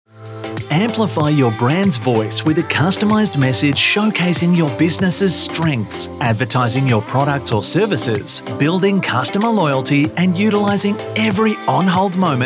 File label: On Hold